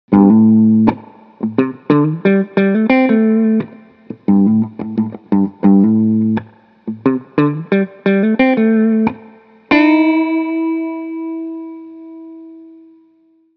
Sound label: Clean Octave